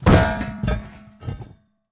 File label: Metal Object Drops, pots & pans